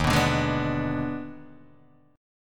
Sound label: Eb Major 7th Suspended 4th Sharp 5th, Guitar Open D; 1,2,0,2,2,0; down